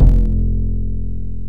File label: Instrument Nylon Bass; Ableton Live 12 Lite; Vector; Sprites